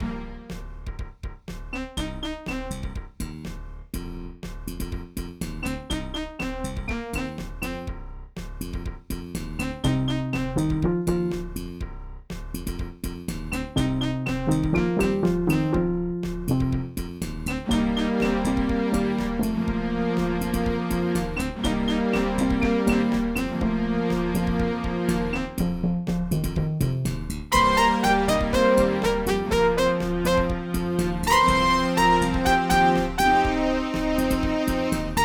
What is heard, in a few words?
Roland SC-88; SimCity 2000